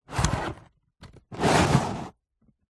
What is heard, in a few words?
food pizza slices slide around in cardboard box; zapsplat